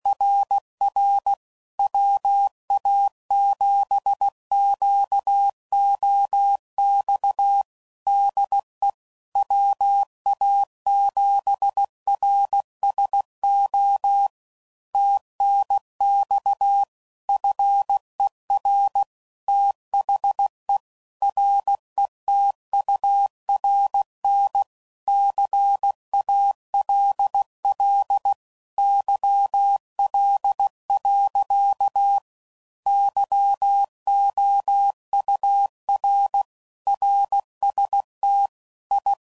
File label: CW Code Groups 15WPM, QSOs; HamRadio; Morse Code; WA7QOX QRX